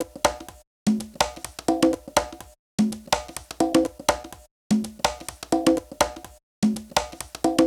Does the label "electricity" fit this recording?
No